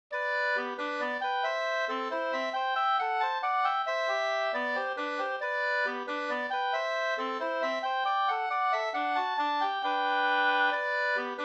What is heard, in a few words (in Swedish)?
hambopolska